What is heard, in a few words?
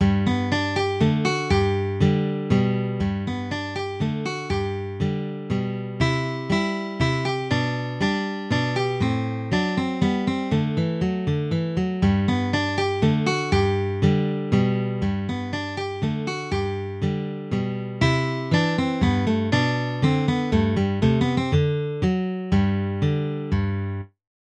minuet in g guitar